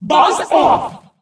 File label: mon hurt vo